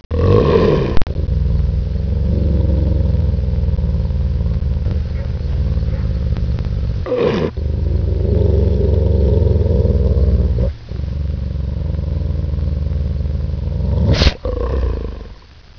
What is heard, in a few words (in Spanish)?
grunido